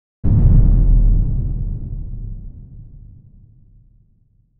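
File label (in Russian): выстрел низкий шумный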